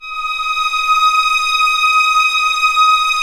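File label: violins, orchestra; String Master Series; Vlns 7 Orch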